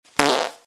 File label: short fart